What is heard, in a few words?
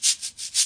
cabasa, 178 bpm; 161 to 180 bpm